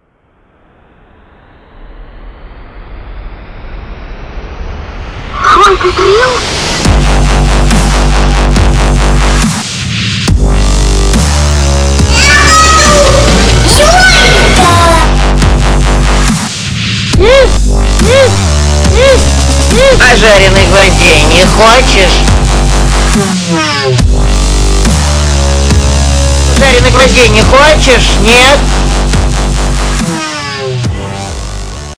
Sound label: DUBSTEP